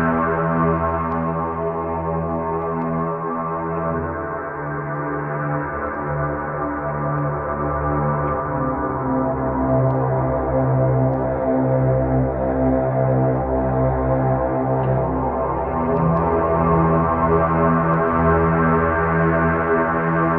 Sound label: sweeperz, Loops Electronic